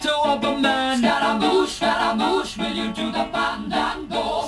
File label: Vocals and piano only